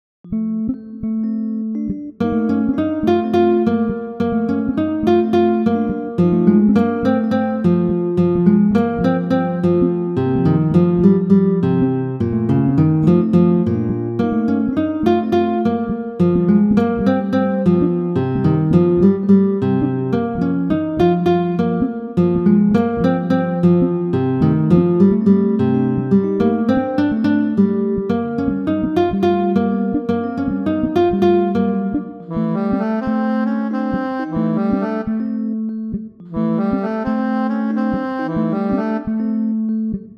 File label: MIDI Blues; spellman studio